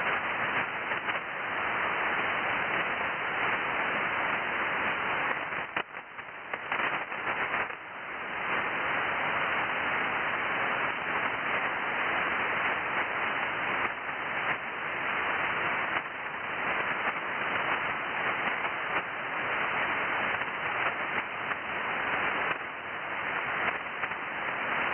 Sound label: cw noise